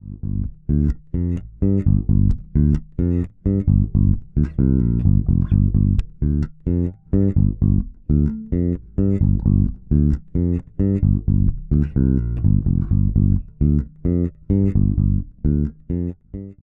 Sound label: Sadowksy NYC Modern Both Pickups